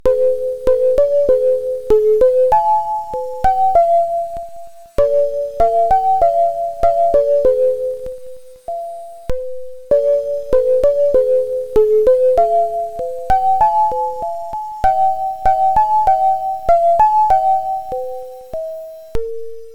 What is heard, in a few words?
sine; mymelo